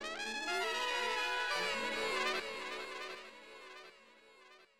HORNBG; HipHop Fx; LiveAct Pool 1

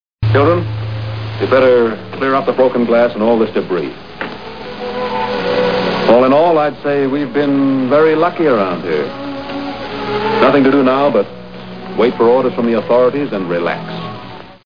Debris; Fallout

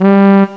Trombone; Sound Effects for Multimedia - Volume 1; horn instruments

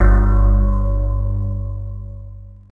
Percu; aloneinthedark4

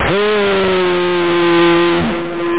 HOOUAAA, VOCALS

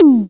tit slap; Frontal Assault

